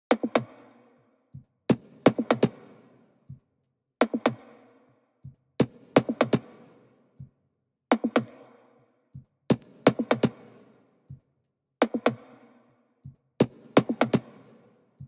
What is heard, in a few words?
123 Top Loop